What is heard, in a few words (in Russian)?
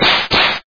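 два быстрых удара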